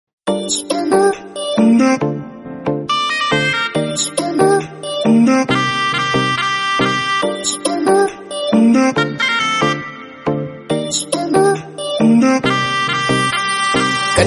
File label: instrumental